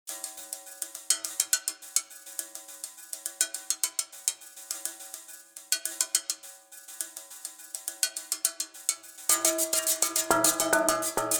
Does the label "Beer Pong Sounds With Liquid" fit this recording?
No